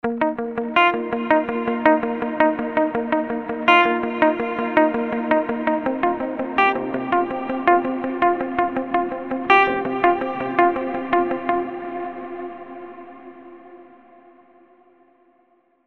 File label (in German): Gitarre Funk Wobble